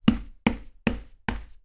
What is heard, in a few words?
household brush small brushing around door frame hard x4